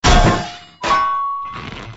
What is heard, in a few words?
drop anvil